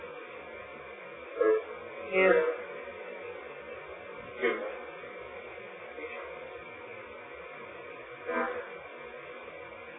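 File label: 2nd Floor Middle Room Spirit Box; wexford general store antique shop return investigation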